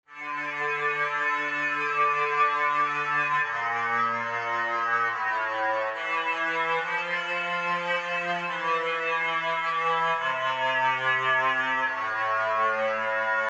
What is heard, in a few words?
electrocello; kamni